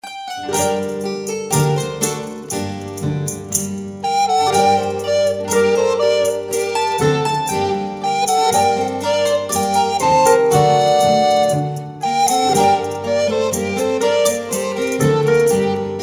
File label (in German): Bourrée, Altblockfloete; Schule fuer Altblockfloete 1